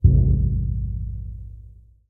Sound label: resonant rusty door impact soft; sonniss gdc